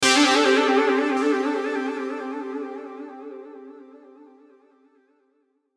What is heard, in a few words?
Hit Synth Warble Delay; Blastwave